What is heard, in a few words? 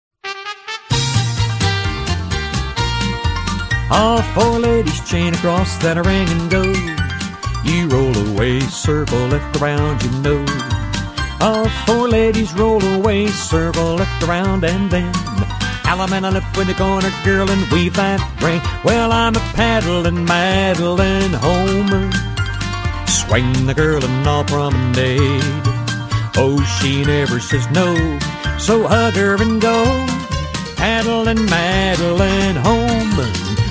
vocal